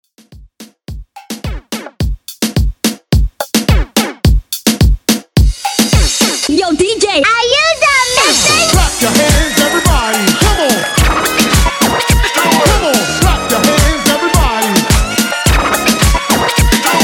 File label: Party ReDrum 107Bpm